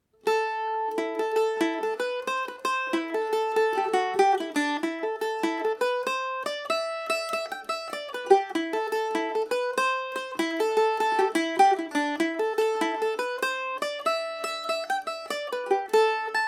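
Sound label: A dorian falder, Irish mandolin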